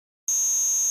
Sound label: Buzzer Ring Mod Tone